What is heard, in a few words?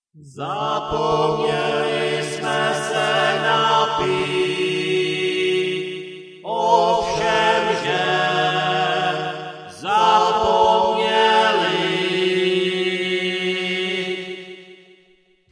choral